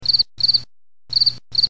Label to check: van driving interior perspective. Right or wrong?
Wrong